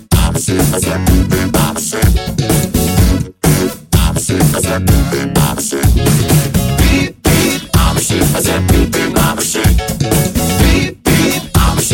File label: Beep beep, ringtones